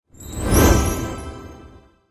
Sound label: line combo; tetris